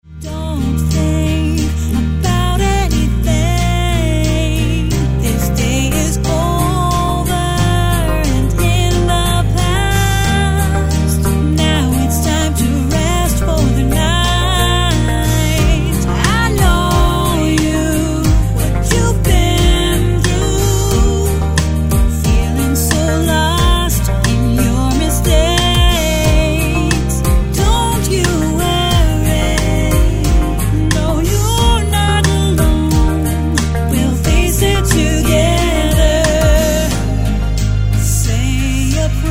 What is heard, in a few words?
gospel singer